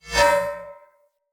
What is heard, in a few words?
Spear Whoosh Accent; Weapons; Enemy; Spears; Nimrod Spear